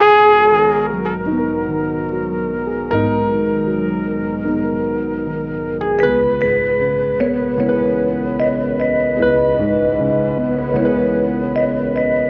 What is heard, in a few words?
horn vibes piano, loops; Phonky